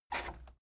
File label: cassette eject push